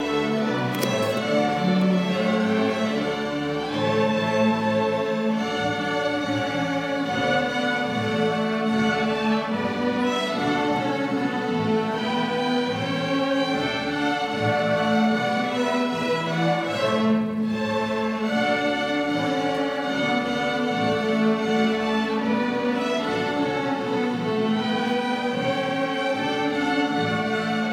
holiday orchestra concert

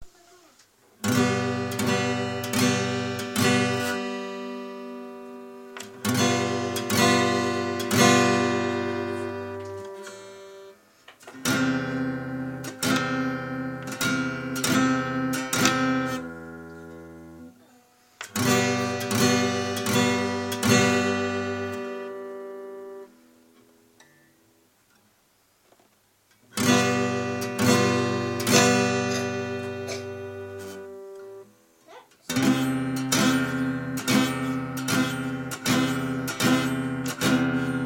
guitar chords C G G7; project 366

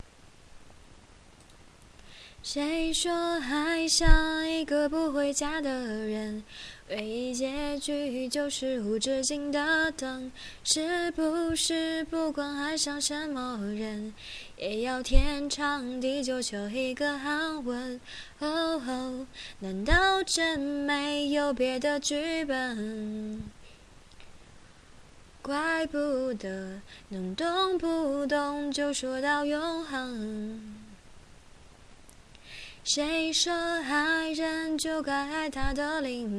lalala

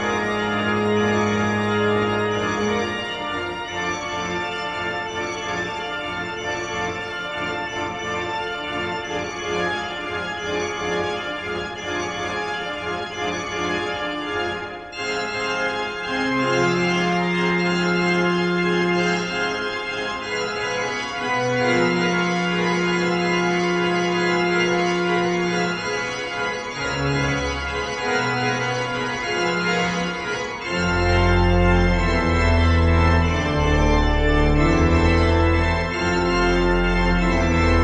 Attleborough church organ, Norfolk

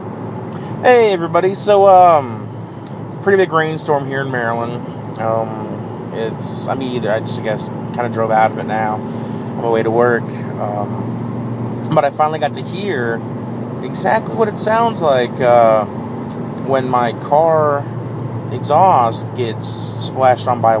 driving, exhaust; with castro weather sucks and water messes with my exhaust

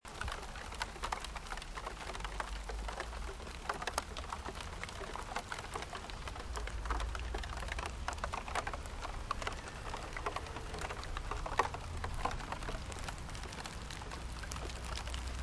raindrops; break internal speaker; moonfruit

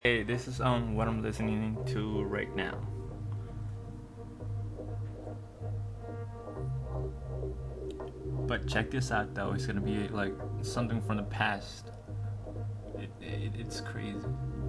ambient music

